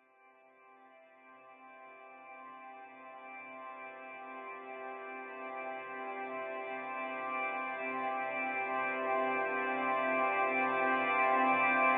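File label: dreamy heavenly organ style glissando reverb; zapsplat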